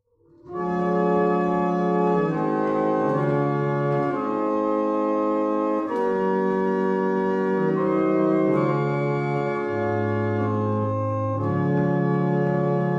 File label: Single chant, barrel organ